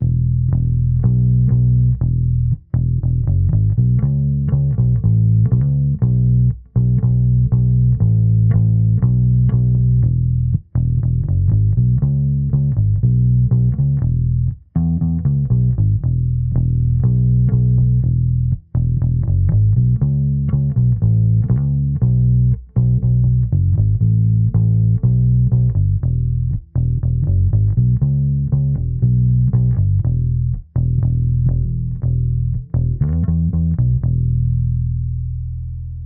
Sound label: solo